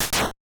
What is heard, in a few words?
Close; openbound